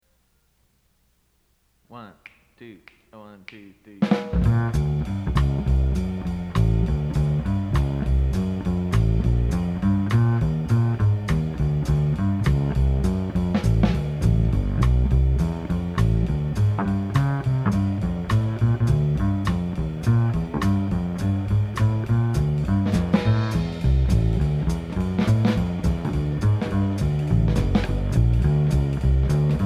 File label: piano guitar rhythm changes; Jazz Studies Auditions